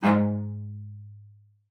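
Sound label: cello spiccato G#2, cello; Xsample VOL-5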